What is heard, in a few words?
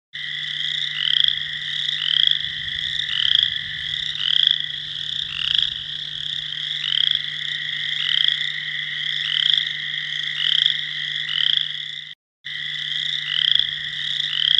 Chorus Frog